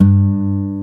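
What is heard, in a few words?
G 1 MARTIN, acoustic guitar; AKAI S6000 CD-ROM - Volume 1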